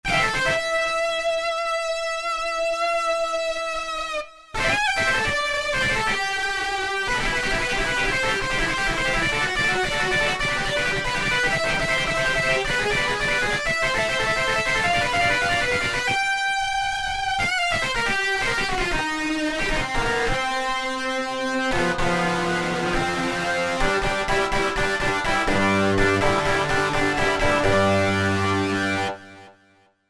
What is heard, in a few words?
Lead Rock Guitar, Korg Wavestation A-D